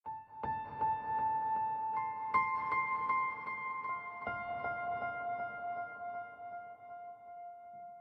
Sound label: Piano